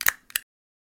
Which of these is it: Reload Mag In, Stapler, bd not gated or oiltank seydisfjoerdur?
Stapler